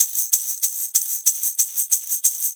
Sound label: Metal Morraccas, Perc Loops; Sonic Foundry (Sony Creative Software); Drum Tools